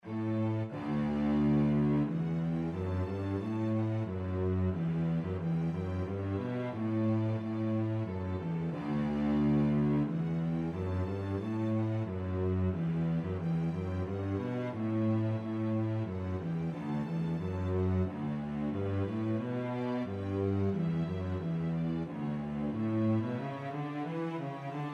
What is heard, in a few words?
Cello; Lindisfarne